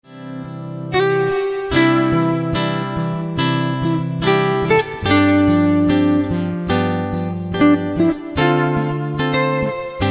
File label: Guitar; Classical